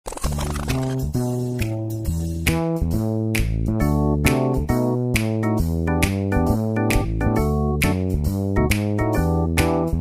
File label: Instrumental